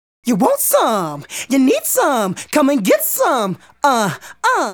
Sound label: Male Solo, Hip-Hop and RnB Vocals